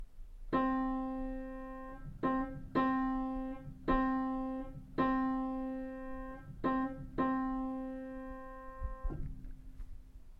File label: cymbals; cymbals crash bang